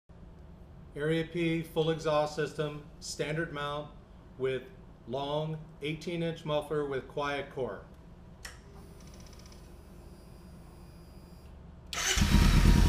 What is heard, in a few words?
ninja 300 standard mount long quiet muffler